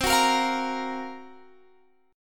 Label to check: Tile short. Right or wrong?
Wrong